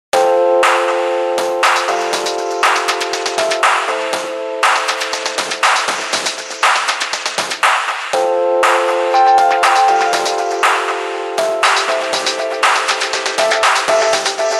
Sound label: Htc Guitar Ringtone